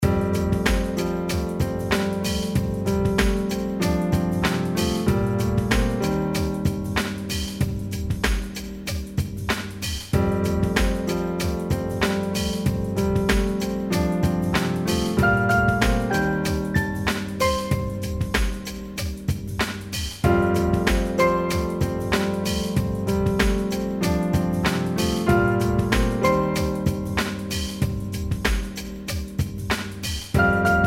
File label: Downtempo piano